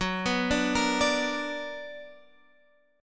B Minor Major 7th

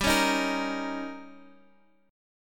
Eb 7th

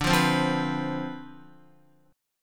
D Minor 13th D, Guitar; Dm13 strummed; JGuitar

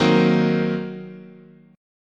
Eb Minor 6th, guitar chord

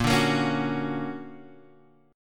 D Augmented Major 7th A sharp, guitar

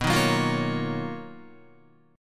B-Major Sharp 11th B down Guitar; BM#11; strummed; JGuitar

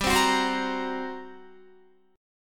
G Minor Major 7th Double Flat 5th, G, Guitar; GmM7bb5; strummed

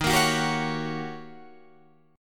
D sharp 7th Sharp 9th, Guitar, Open D

